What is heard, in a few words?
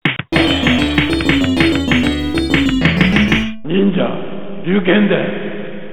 Round Clear; Shadow Warriors; Ninja Gaiden; Ninja Ryukenden; arcade